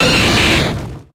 Grito de Magmar

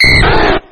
Grito de Dratini

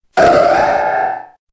Grito de Mega-Absol

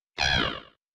Grito de Eiscue forma cara deshielo

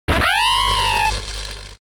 Grito; Luxio

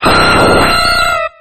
Grito de Aerodactyl

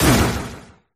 Grito de Urshifu

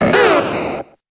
Grito de Bulbasaur

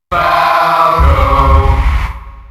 Grito del público; Falco; SSBM

